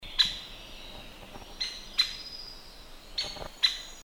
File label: White backed Woodpecker; Dendrocopos leucotos; call; Bird Call Recordings